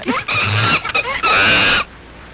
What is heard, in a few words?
monkey scream